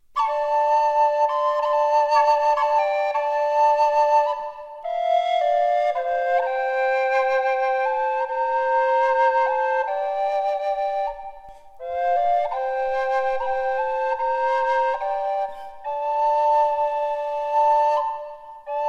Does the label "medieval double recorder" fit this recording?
Yes